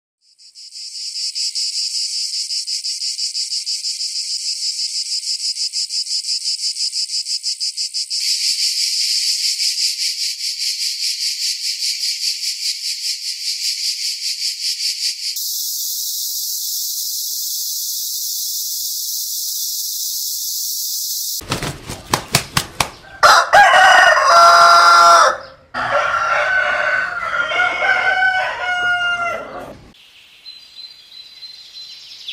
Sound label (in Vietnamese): chim ga ve